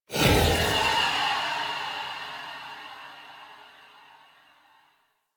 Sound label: Purgatory Ghost Spawn; Warframe